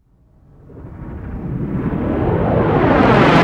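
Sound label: FX left, attacks; Best Service Advanced Media Trax Modern Composer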